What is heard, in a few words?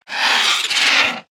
Whelp Attack